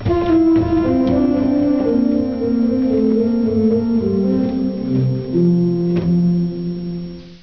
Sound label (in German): Tenoroktave 4; Martin